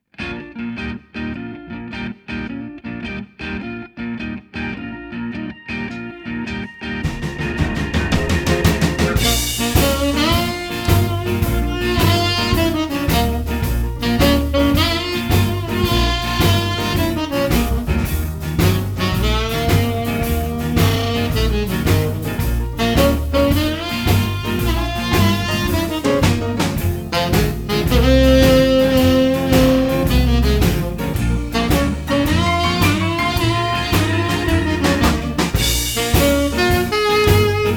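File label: basic blues exercises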